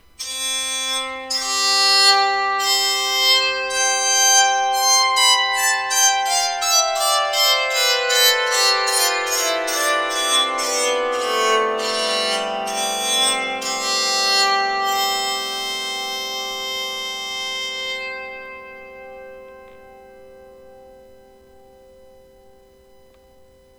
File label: scale, bowed psaltery